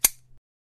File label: large clippers